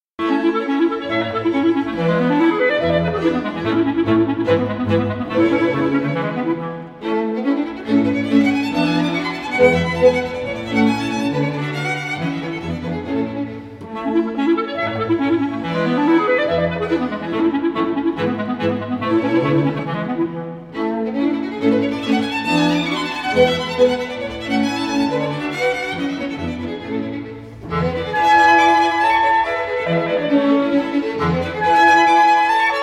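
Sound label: Mozart clarinet 4th movement; Mozart - Clarinet quintet in A major; Festival Montalcino 2012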